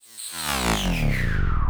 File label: hellicopter